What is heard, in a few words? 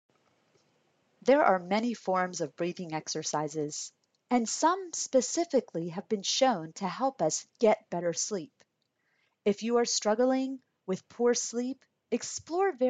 Breathing